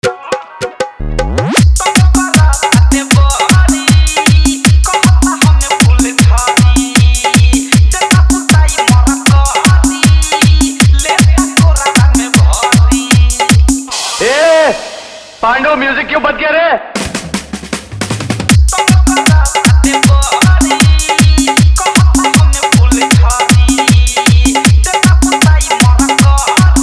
Hard Dhollki Bass Remix

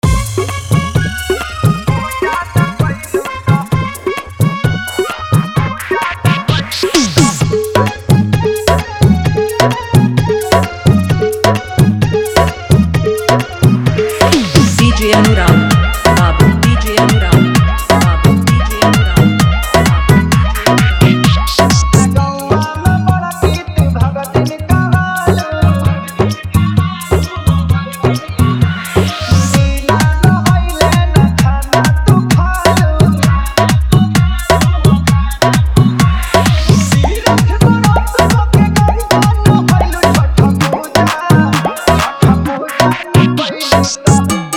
Bhojpuri Chhath Geet Faadu Mix

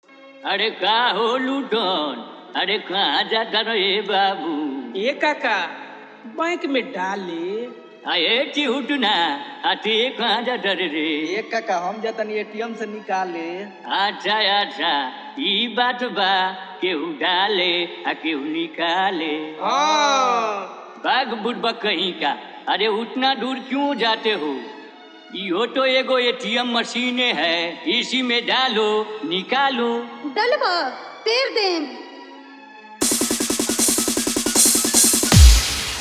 Desi Dhollki Bass Dance Mix

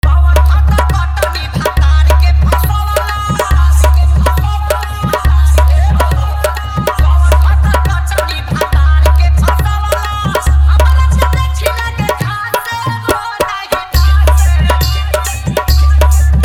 Hard Dhollki Mix

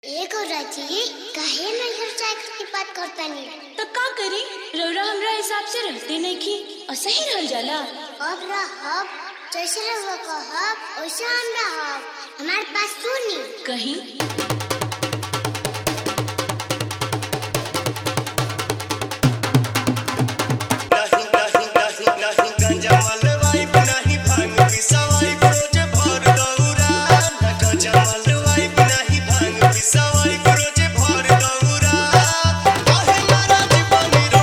BolBam Song; Ol Is Gold

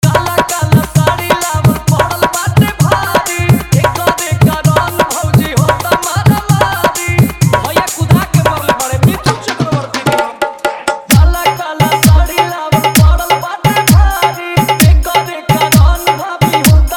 Bhojpuri Hard Dhollki Bass Mix

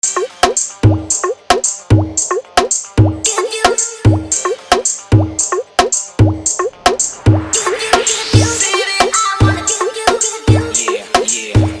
Bhojpuri Dj Song Hard Dhollki Bass Mix

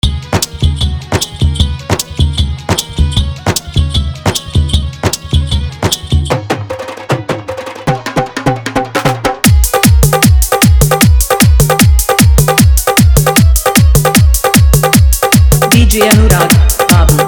Holi Bhojpuri Hard Dhollki

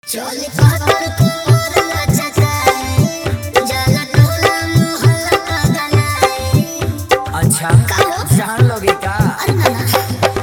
Hard Dhollki Bass Mix

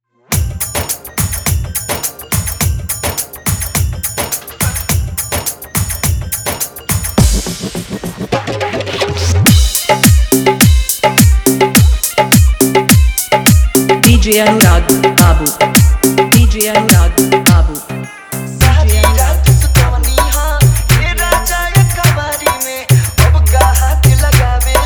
Bhojpuri Song Hard Dhollki Bass Mix

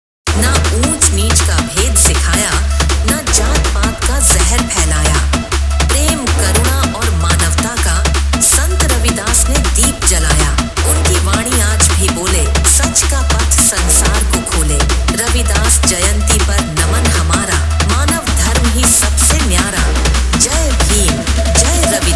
EDM Extended Bass Mix; ROAD SHOW COMPETITION; RAVIDAS JYANTI SONG

